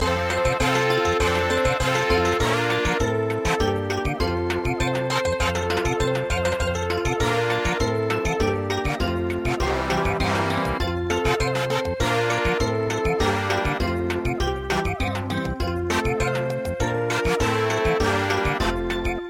Dance, samba; Shue Makers Dance

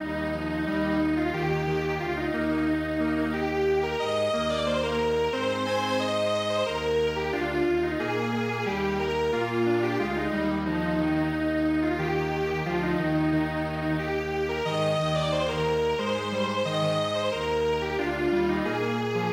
Strings Quartet

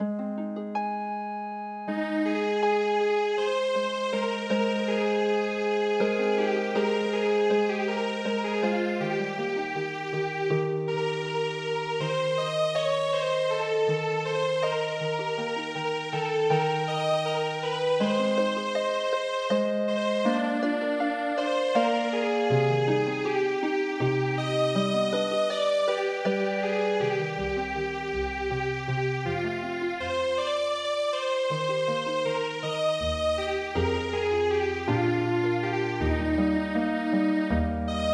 Lullaby; Erminie

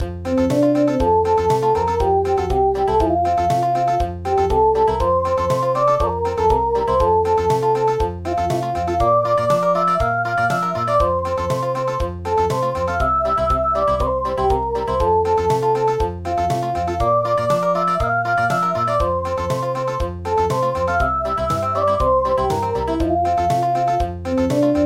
Country, midi; Sarinande

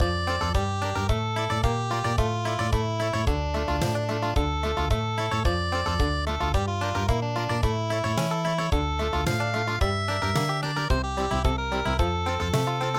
Country

